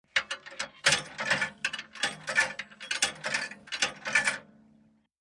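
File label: British Call Box 4 Pennie, coins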